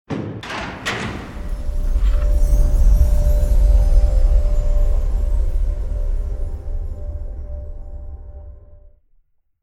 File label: Water Rise; Ruins Quad; Journey